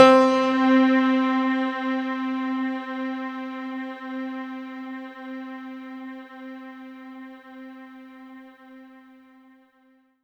C4, synth; 90 s Sample CDs; NiceBeats; SynthLine VOL-23

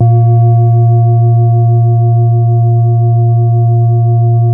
BOWL, Tibetan Bowls

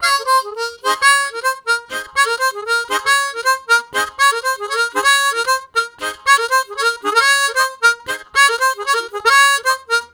Step Down, Harmonica Riffs; Zero G

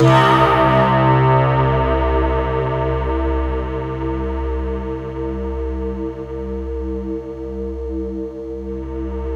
C3, SynthLine; 90 s Sample CDs; NiceBeats; SynthLine VOL-22

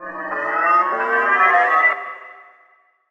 weird; Best Service - XXL Production Effects

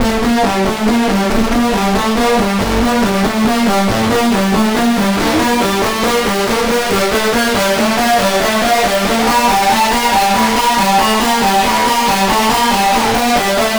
Synth Distorted; Electrocution

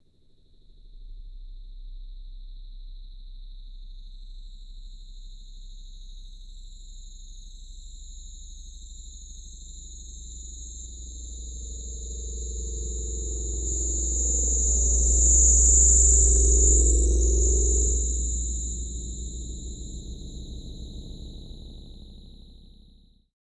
PSYCHO, Anime Sound FX, AMBIENT

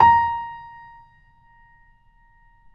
BOSENDORF

